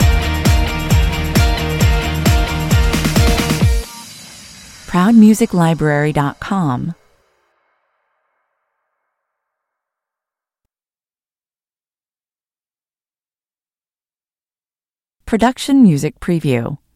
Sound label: fast car stinger